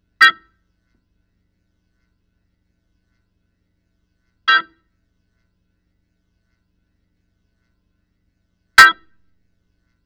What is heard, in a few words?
Organ